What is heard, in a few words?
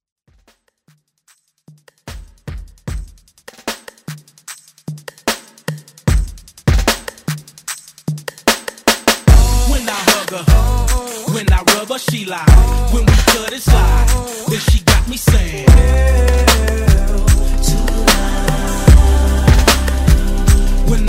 Throwback; Redrum